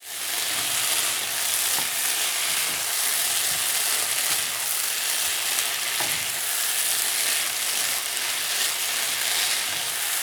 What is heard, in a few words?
cooking with frying pan; tacos in mexico